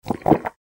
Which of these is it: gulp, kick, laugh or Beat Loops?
gulp